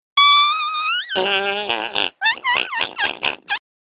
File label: Toilet Sms